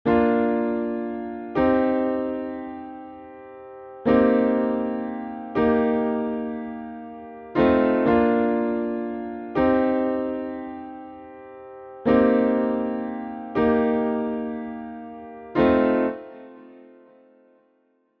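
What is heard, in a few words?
Bb Major 7ths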